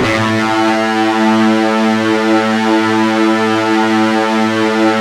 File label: FUZZ A 1, Guitar; East West; Steve Stevens Guitar Samples Collection